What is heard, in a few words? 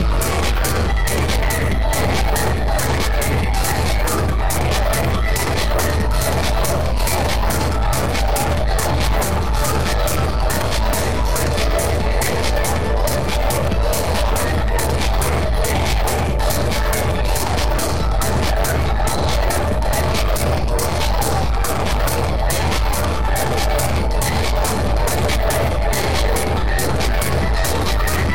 zombie trance dance music with drums